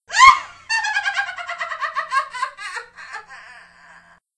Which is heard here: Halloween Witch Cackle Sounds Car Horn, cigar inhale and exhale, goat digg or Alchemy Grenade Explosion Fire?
Halloween Witch Cackle Sounds Car Horn